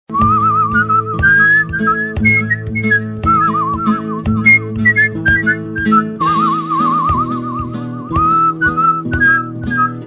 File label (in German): pfeifen